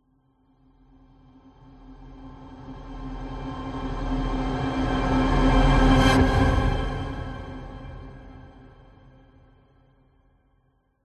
sound design cinematic orchestral music riser dramatic horns strings; zapsplat